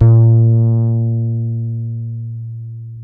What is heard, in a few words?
HOLLOW FRTLS, Bass; Spectrasonics; Bass Legends